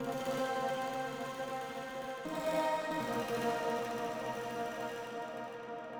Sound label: ethno, 160, C